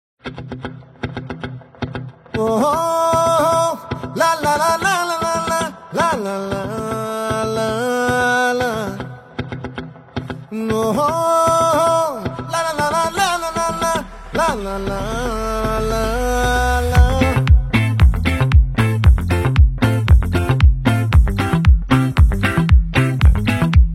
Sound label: song instrumental intro